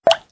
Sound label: Dialog Box Appear; RimWorld Biotech; RimThemes; Hunt For Me